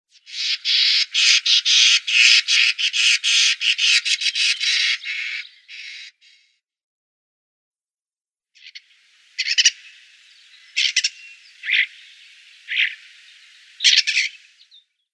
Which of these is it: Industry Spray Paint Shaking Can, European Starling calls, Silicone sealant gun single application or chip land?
European Starling calls